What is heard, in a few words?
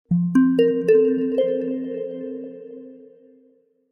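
Marimba, notifications; Tecno; Spark Go 2022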